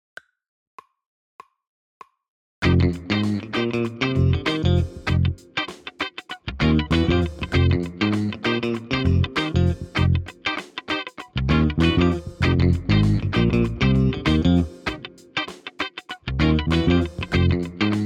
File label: railroad Creek drumless